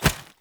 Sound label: Foley Chest Thump; Characters; Enemy; Melee Grunt; Sinecure